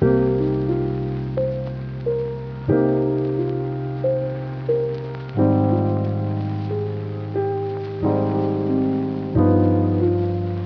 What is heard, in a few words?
Lofi Melody Loop - 90 BPM C Min; Cymatics; 2020 Melody Collection